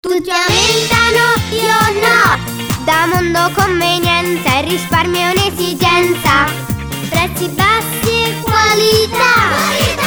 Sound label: Jingle